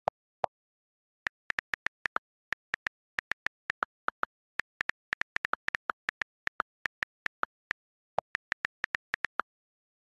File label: Satisfying iPhone Keyboard